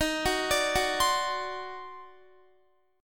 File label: D sharp Minor Major 13th D sharp Guitar; arpeggiated